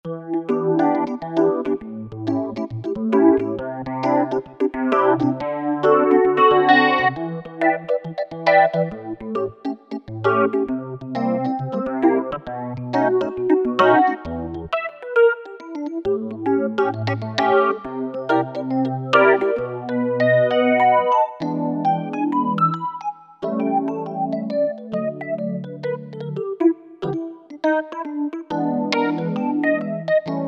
Swirling Electric Piano; PIANOS and KEYBOARDS; Apple GarageBand 2

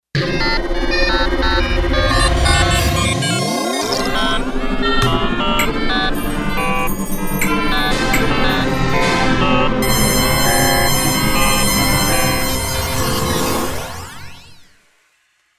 FX Menu

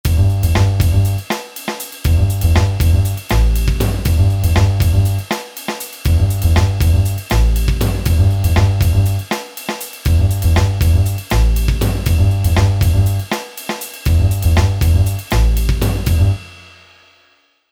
Jazz

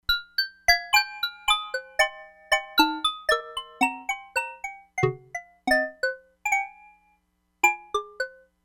MALLET BELL, Crumar Bit 99; Factory Programs

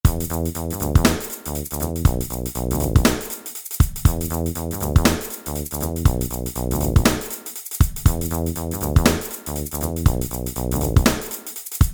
Reggae

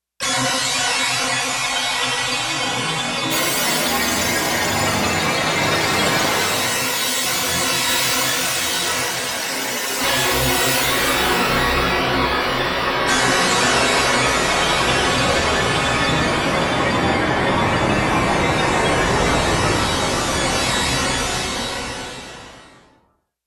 Shatterd, synth

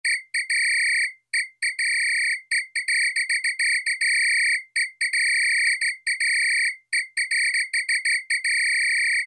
REFS WHISL, Yamaha DX7

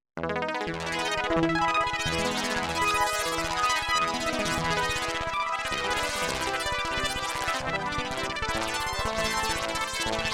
Arp Swirl

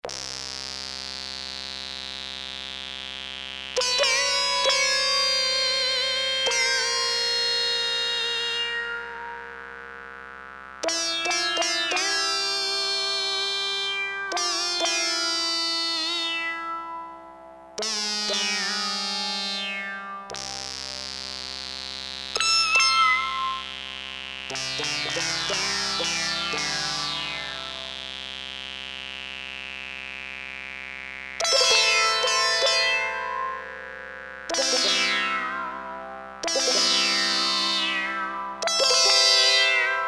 SITAR, Roland Jupiter-8; Factory patches

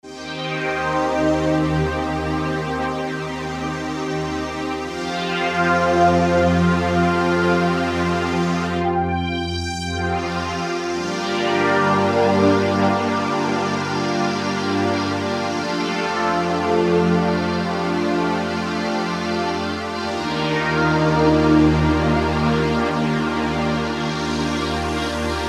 Subtle Pads

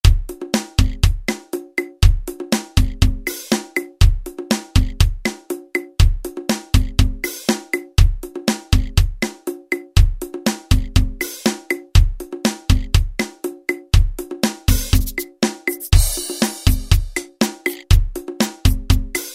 Latin, Alesis SR-16; Preset Patterns

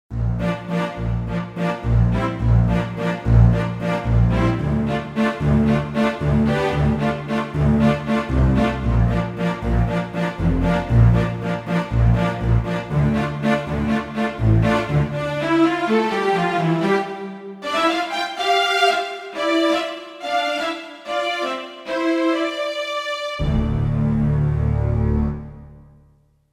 Marcato, Roland JV-880; Factory Patches

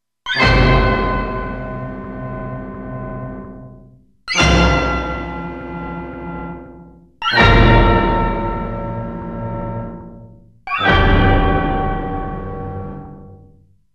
Hit

